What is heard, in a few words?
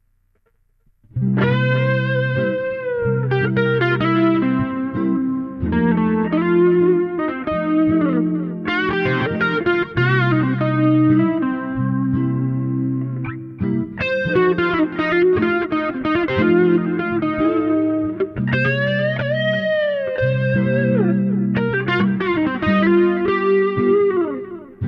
blues